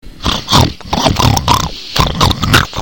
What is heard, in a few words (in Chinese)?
zhu